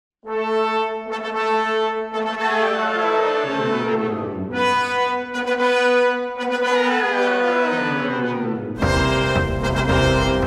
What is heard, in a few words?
piano; level 2